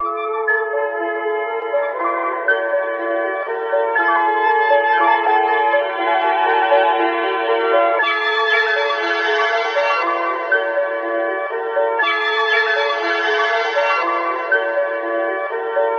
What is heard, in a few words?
flute and piano, Loops